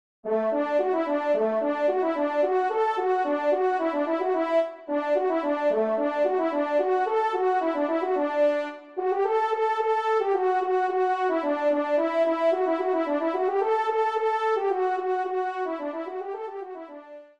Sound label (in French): TRP